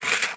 Impaler Earth Out

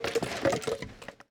Bone Pile Movement

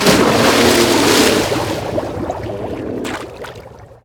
Swamp boss Death

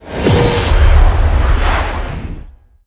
teleport

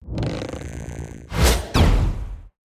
Normal Attack

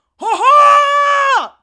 Cloth Battle Cry

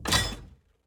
Iron Trap

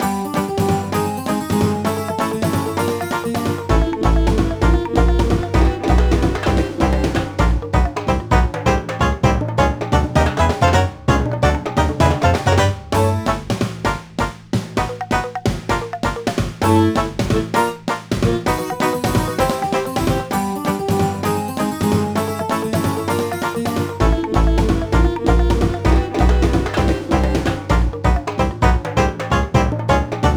music cave bpm130; Donkey Kong Country Returns HD

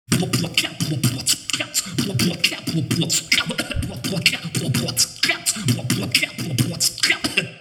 beatbox 16 beats; hive

